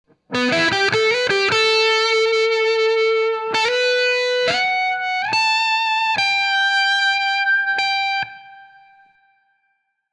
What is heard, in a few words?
singing lead, tonecore